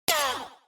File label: scream